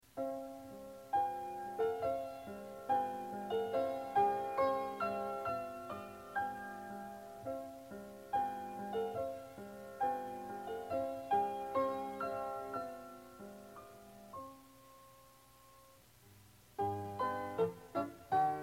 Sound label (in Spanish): vals; ensueno seductor